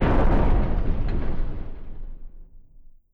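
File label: Collision Interior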